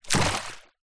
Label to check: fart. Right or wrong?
Wrong